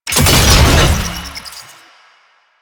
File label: Research Pod Stop; Warframe; Jade Shadows; Ascension